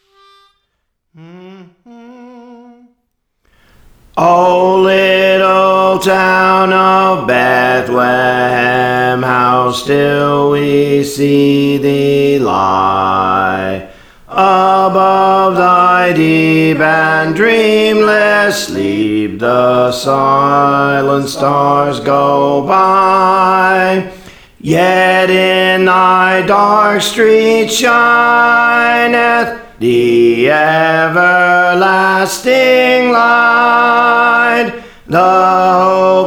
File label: Bass, Songs; Praise For The Lord Training